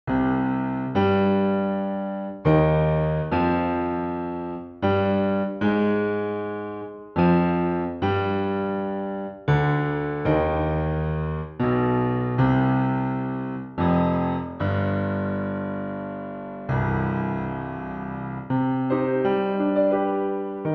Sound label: Passacaglia in C Minor, Arr. For Piano; BWV 582; Eugen D'albert